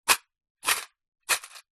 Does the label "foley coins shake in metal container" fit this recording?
Yes